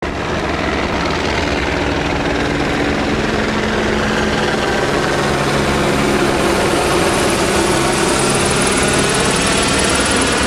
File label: helicopters over LA; air; best service Blue Box